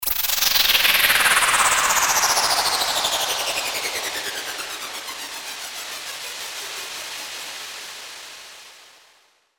WHOOSH

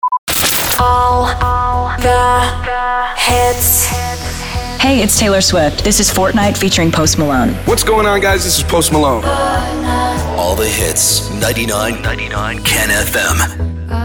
SWEEPER POWER INTRO 96BPM

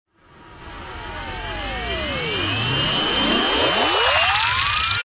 RISER

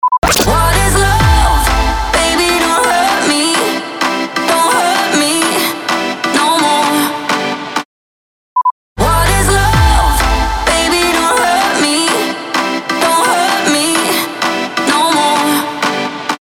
128BPM G

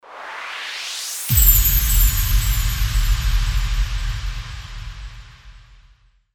CHIMED IMPACT

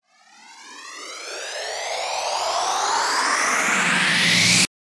RISER

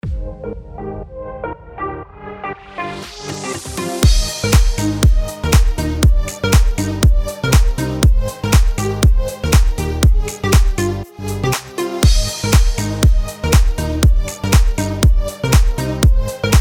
BED HOUSE G#MIN 120BPM

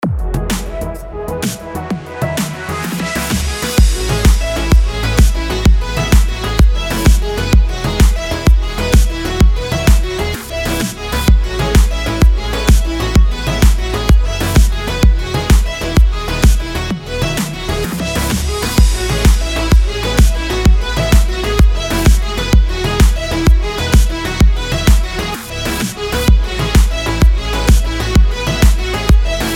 ELECTRO F# MIN 128BPM

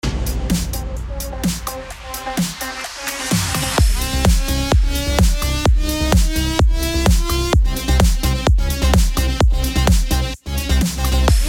ELECTRO HOUSE CMIN 128BPM

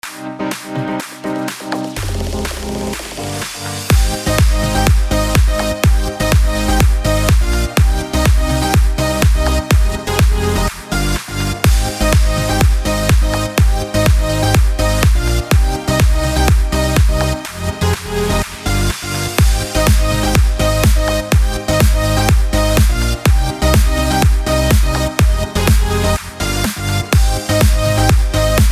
ELECTRO HOUSE G#MAJ 124BPM

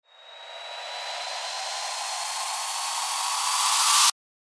RISER

